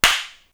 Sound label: foot